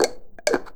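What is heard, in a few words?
PRESSURE JAR, household; AKAI Anime Sound FX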